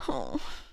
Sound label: Female Tired Breath; Lucius II The Prophecy